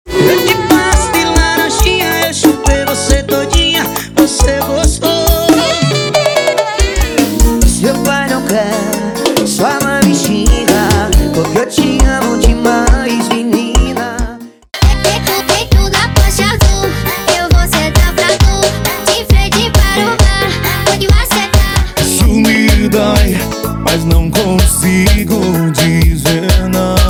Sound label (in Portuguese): FORRO PISEIRO